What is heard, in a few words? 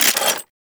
Grenade Launcher Mech; Thano Tech